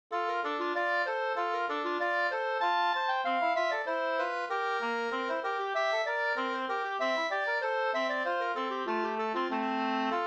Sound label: polka